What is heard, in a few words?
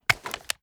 sks drop; Tacz1.1.5+; RSWS 1903